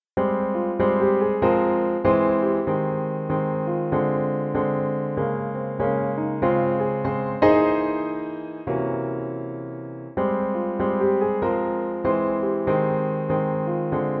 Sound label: Minor mood, Piano seul; Jazz